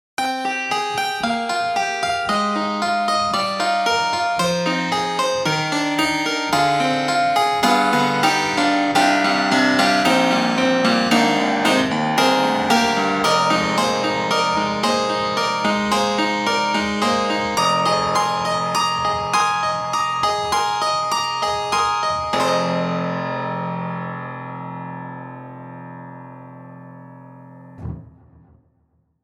Grand Rhapsody Piano Tack Piano; Waves

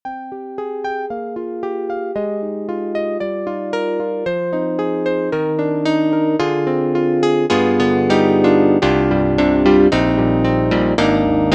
Electric Grand Piano; Basic

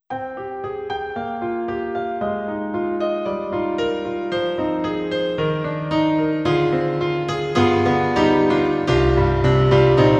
Orchestral Concert Piano; EDIROL

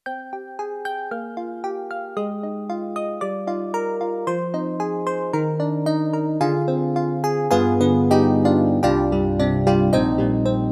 Yamaha MU1000EX DX Mallet

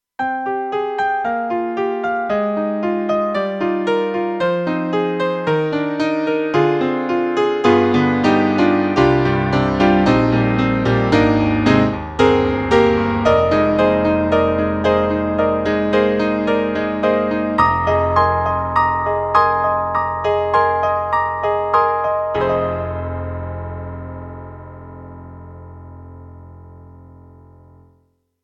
Piano; Roland; SC-8850; Piano 1

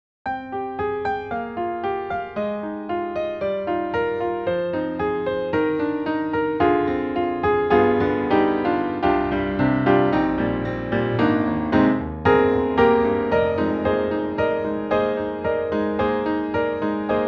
EXS24 mkII, Steinway Piano; Apple; Logic

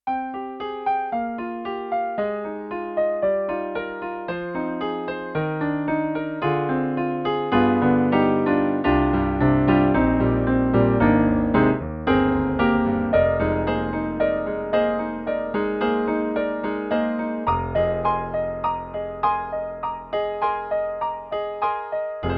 Mellow Ivory; E-MU PROFORMANCE1+

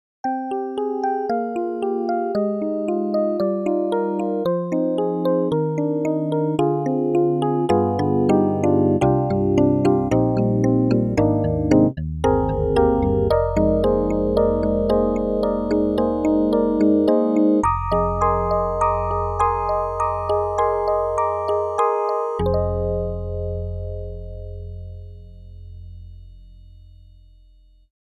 percussive piano; EastWest; Quantum Leap Goliath; GS-1

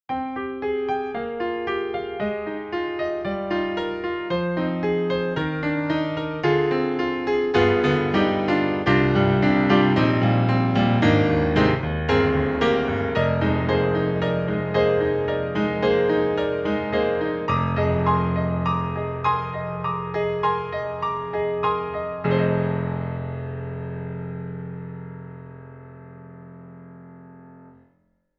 Kawai-Ex Pro; Acousticsamples

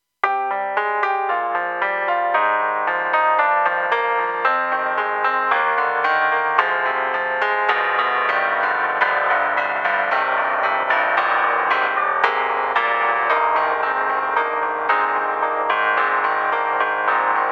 Radio Piano; KORG TR-Rack

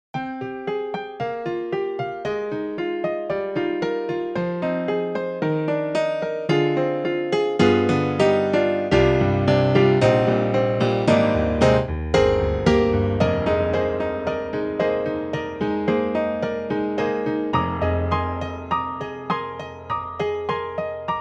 EDIROL Super Quartet, Rock Acoustic

